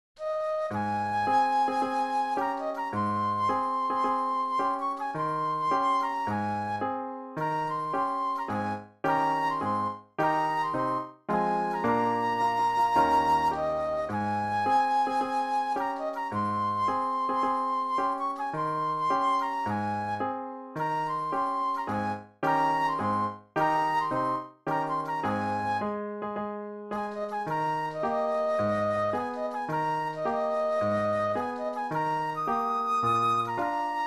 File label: triumphal march flute